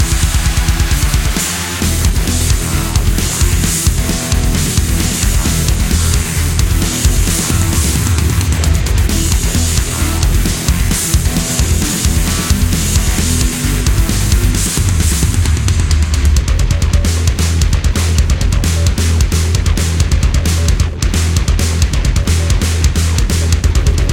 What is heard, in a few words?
Music Fight; PositronX